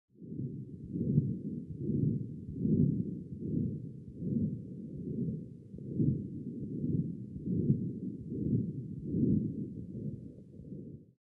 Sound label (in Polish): Szmer maszynowy